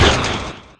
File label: Pinball Launch Fire; Blastmorph Pinball; xbox series xs